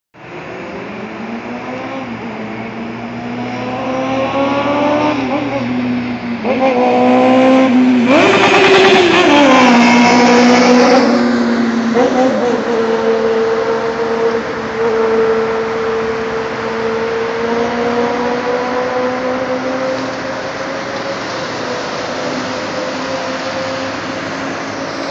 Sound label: Yamaha Xj6; Yamaha Xj6 Wr